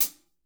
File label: hat, drum